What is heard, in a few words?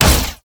Proj Fire Shot, weapons; Lizzie; Warframe